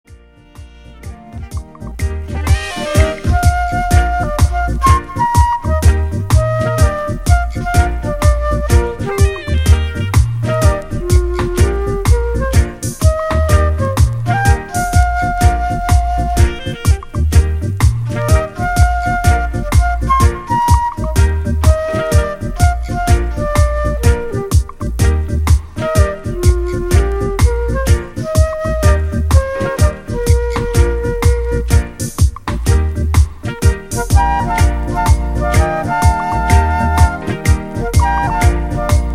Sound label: Fluty Flute